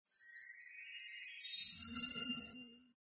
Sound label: boot audio; Gionee; GN206